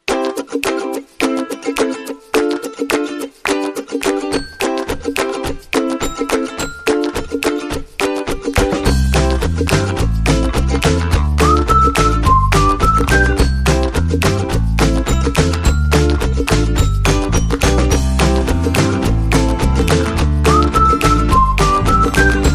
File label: Quirky Ukulele